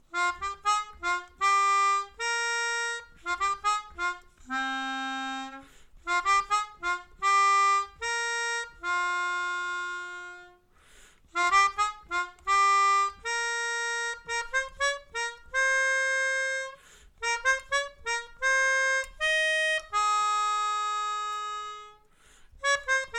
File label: Melodica